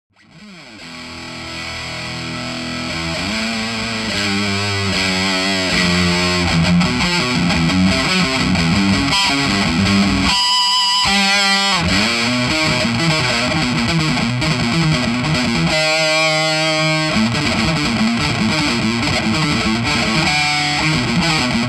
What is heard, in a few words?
tone; big soco pi